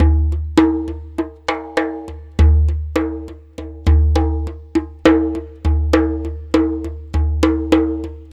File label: Dumbek Odd Pulse, Ethnic Percussion; Sonic Foundry (Sony Creative Software); Ambient Atmospheres & Rhythms